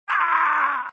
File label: creature Death; fratboy